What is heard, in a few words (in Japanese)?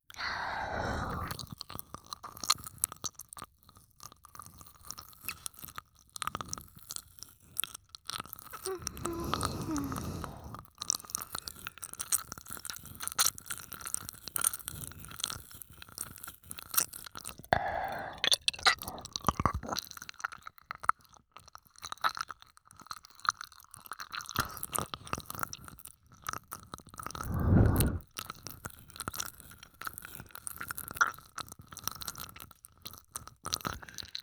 耳舐め, ASMR; SEあり